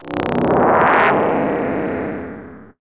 synth rumble; Zero-G Ambient 1; SYNTH BOMBS1

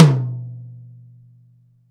TOM, Drum Dry; East Collexion; Drum 1

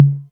808 Tom Mid

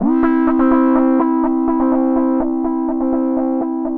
Analog Rhythm; E-MU Producer Series Vol. 6 – Analogia Project (CD 1)

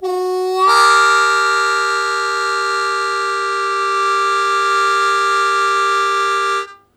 Blues Chord Attack C1, Harmonica; Zero G

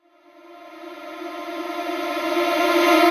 Reverse Vocals F; Vengeance - Essential Clubsounds Vol.4; Effects

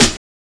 Snare, Drum and Bass

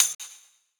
Tamb; Zero-G - Future Wave; Drums; Xtra

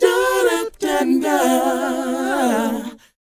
DOWOP G; ILIO; Vocal Planet

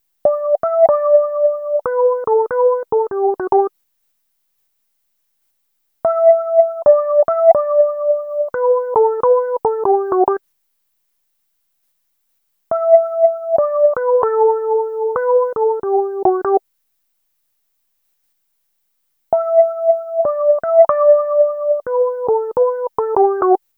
EP Riffer, Electro Lounge; Sonic Foundry (Sony Creative Software); 072 Green Street

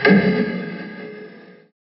fx echoed

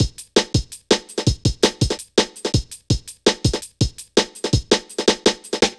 Drum Loops, Jungle

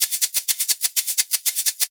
Percussion Loops, Shaker & Tamb; Houseworx

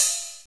open hh, House Side Of 2 Step; USB Soundscan vol.51; AKAI

